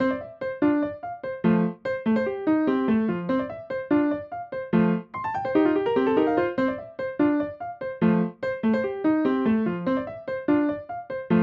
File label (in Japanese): スマホ着メロiphone風; ジングル